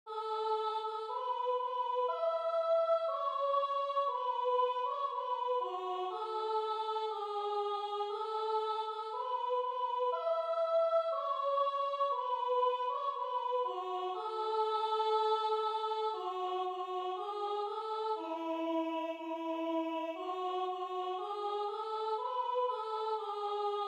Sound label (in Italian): Soprano